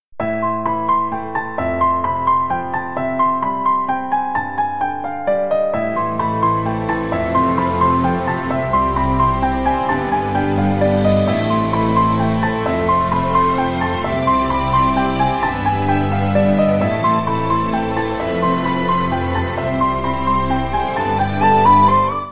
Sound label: a mothers love bgm piano ringtone; tamil ringtones; pattas